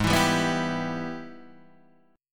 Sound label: Ab Minor Major 7th Sharp 5th, Guitar, Open D; down